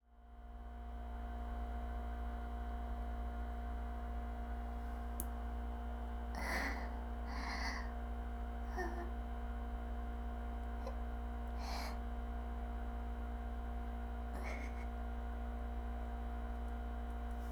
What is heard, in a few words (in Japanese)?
大、喘ぎ、ポチャ音, トイレ